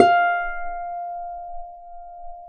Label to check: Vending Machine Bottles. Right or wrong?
Wrong